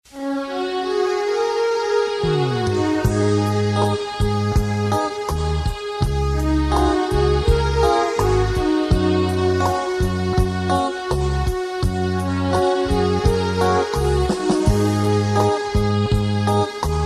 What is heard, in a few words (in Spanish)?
cumbia